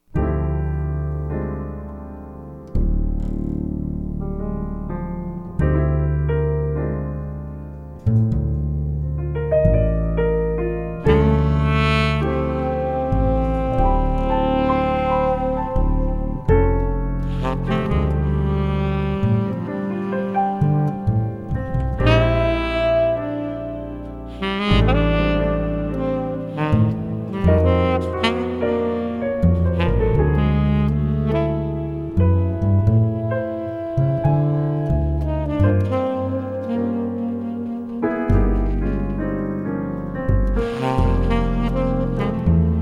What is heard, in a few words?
jazz piano